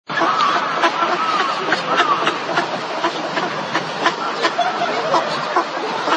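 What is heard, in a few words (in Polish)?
rożeniec, głosy; wroblowe; anseri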